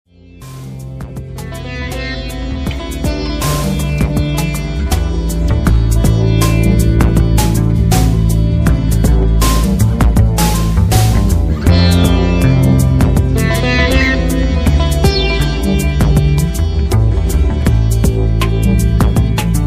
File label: delhi blues